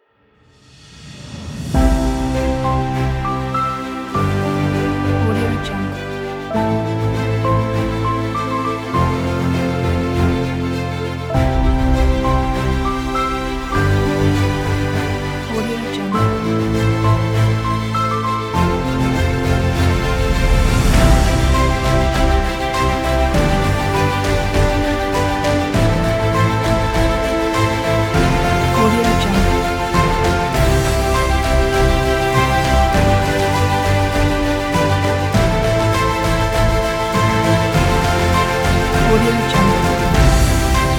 uplifting cinematic inspiration kit

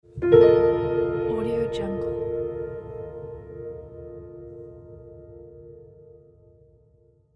mystery piano logo

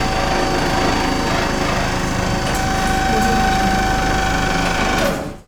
servo auto car lift; soundmorph

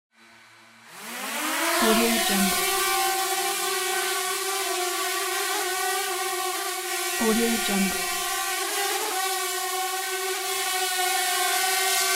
drone takes off and flies; jdproduction

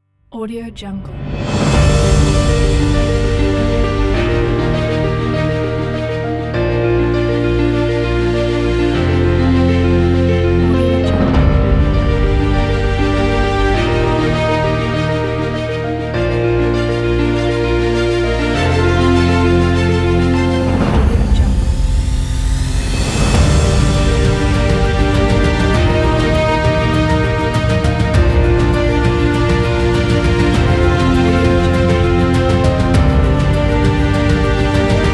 inspirational cinematic piano

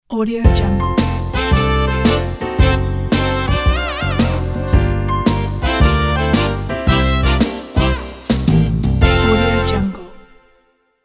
vintage movie ident

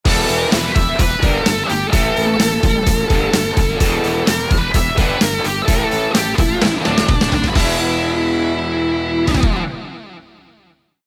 heavy rock logo sting

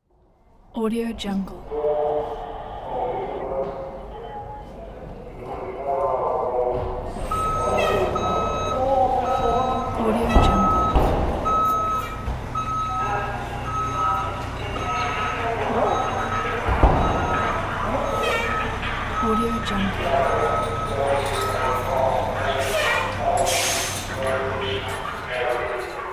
fire station

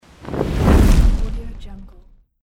fire staff

